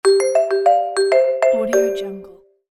phone ringtone; interface sounds

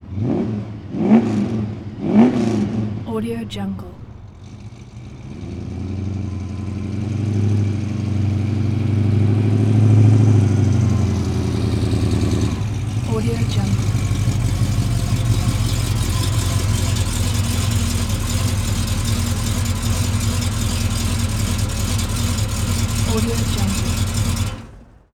plymouth up stop off os revs beefy ext; گاز دادن به ماشین

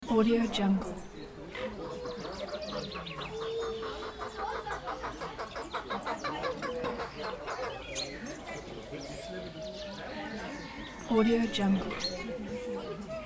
farm hens goats birds people visitors chatters

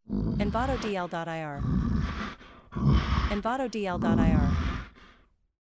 Bear Breath Fast; نفس کشیدن خرس; nature sounds

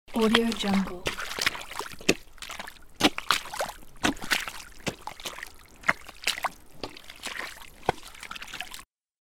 footsteps in deep wet mud loop; human sounds

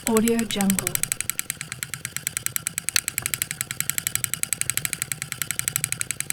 synchronizer spin 35mm film rotating with break; 35 میلی متری; interface sounds

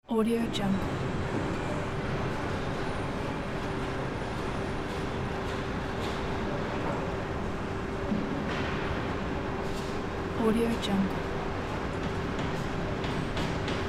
construction site with city traffic in bg; industrial sounds

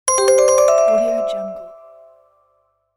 level up alert; game

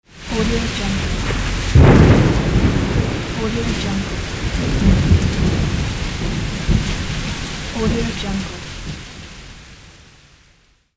thunder; رعدوبرق; nature sounds

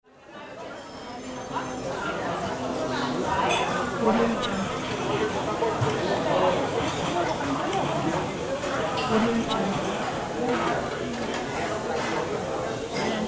dining hall background

